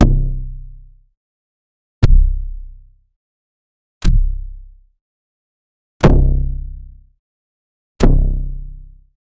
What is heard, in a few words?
low poly arrow hit impact